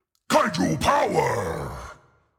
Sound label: Rallying Roar; Patzilla